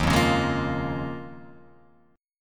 D sharp 7th Suspended 2nd Suspended 4th down Guitar Open F; D#7sus2sus4 strummed; JGuitar